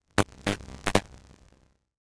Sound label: melee attack impacts magical effects lightning; psfx